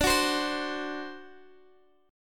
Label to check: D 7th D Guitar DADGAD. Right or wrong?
Right